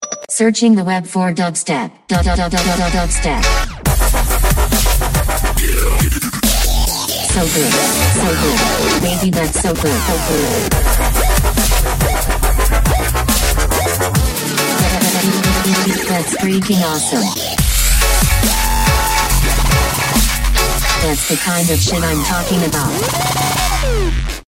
dubstep ringtone

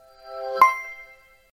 nokia email; amber

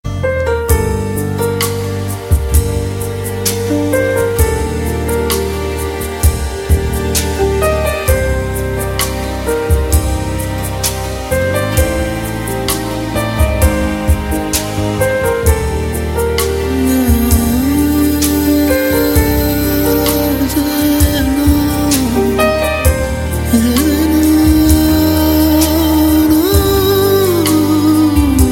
instrumental

